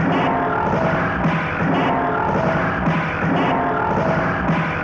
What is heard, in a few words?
Crude Tape Loop, Tape delay; radiosonics